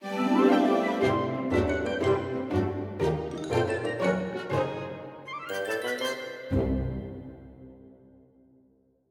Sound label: You Won